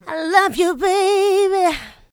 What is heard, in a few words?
Female Vocals; Houseworx